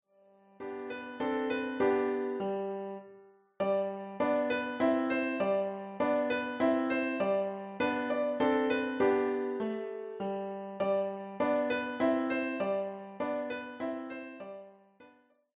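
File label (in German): Kommet ihr Hirten, Klavier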